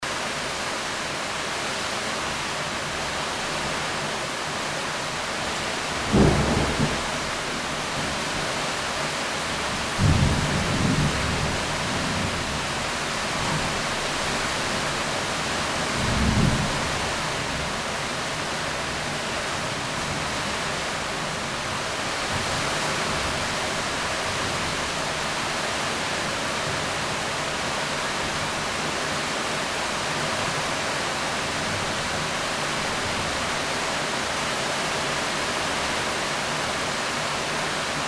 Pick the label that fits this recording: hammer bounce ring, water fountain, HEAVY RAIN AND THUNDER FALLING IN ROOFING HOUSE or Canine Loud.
HEAVY RAIN AND THUNDER FALLING IN ROOFING HOUSE